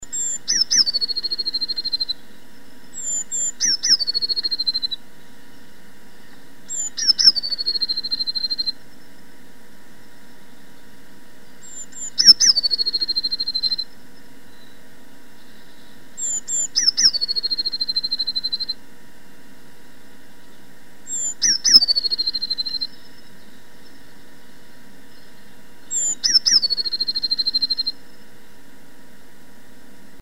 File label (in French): mesange bleue, oiseaux